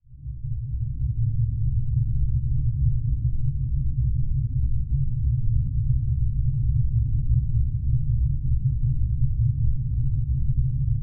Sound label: Dark Cinematic Horror Room Tone; Phasmophobia